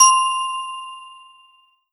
Korg NS5R Crystal C6

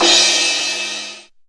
Yamaha RX15 Crash Cymbal

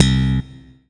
Roland SC-88 Slap Bass C2

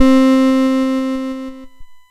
1980s Casio Piano C5

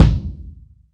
Kick Drum

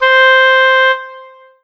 Roland SC-88 Oboe C5

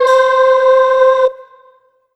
Solo Vox C5; Korg NS5R

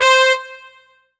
Salsa Horns C5; Korg N1R

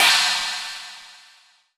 Roland SC-88 Chinese Cymbal

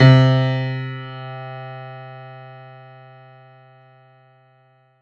Yamaha TG100 GrandPno C3

